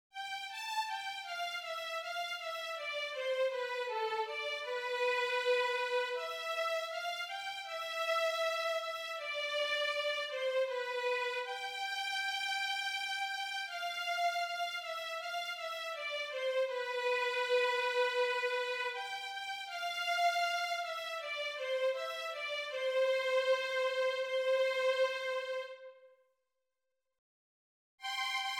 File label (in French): VIOLON; violons